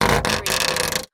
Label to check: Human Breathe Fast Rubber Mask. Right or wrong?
Wrong